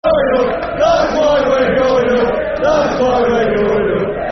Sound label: Football Chant (Soccer Song); That's Why We're Going Up; Leeds Lufc